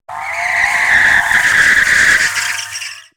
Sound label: Tire Skid; 漂移2